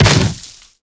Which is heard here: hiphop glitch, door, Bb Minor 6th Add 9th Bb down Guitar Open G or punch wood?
punch wood